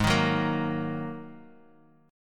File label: Bb 7th Suspended 4th Sharp 5th Ab